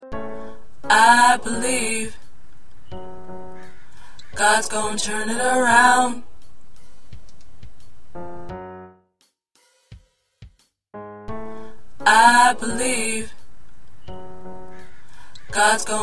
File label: Baritone